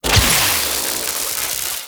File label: Electricity Shock Burst Fire; Warframe; Corpus; Oxium Osprey